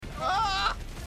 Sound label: augh